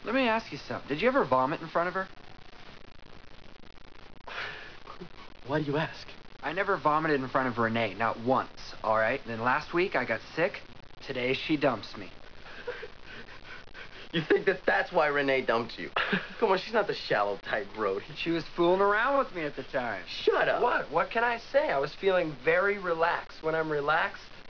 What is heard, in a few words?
vomit